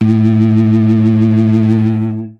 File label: sawtoother, Synths; Notes